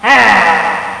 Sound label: "RRRRRR"; gemiti esclamazioni mugolii